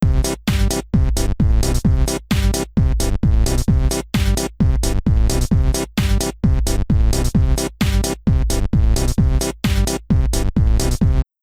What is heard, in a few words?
distraction dance; taunts; props